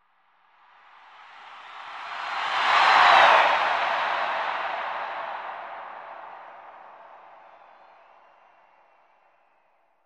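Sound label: Arena Crowd Cheer; sports arena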